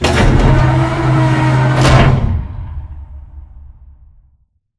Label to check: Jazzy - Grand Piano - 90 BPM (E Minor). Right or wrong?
Wrong